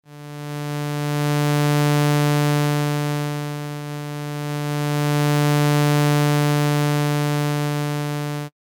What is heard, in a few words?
Gain only